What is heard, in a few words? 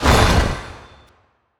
Spike Trap Reveal